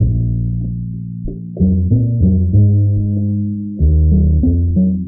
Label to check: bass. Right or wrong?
Right